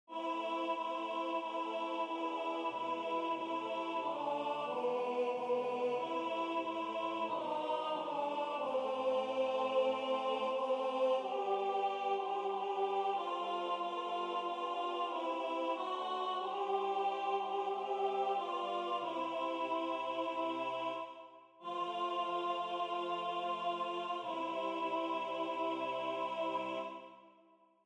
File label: alto; Waiheke Island Choral Society